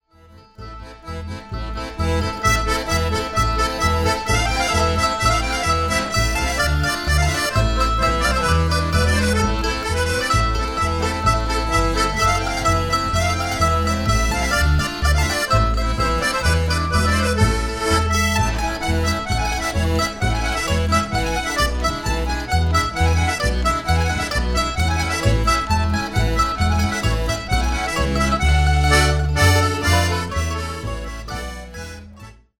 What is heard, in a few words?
polka